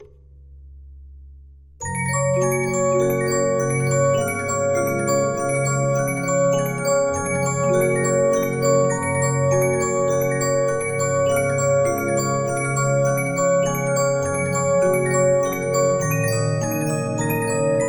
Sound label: Regina, 15inch disc musicbox